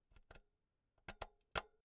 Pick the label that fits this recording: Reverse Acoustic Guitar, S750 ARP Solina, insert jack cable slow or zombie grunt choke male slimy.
insert jack cable slow